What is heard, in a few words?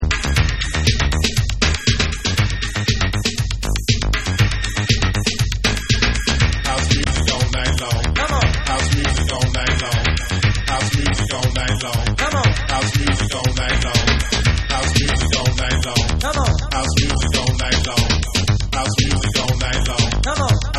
Club House Mix